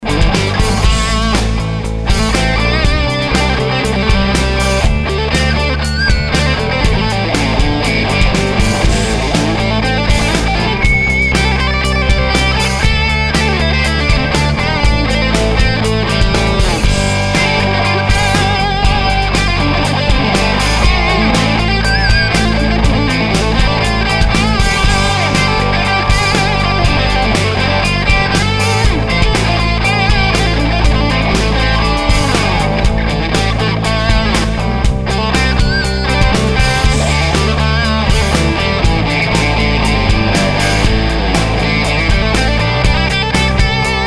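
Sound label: thinline, maple thinline telecaster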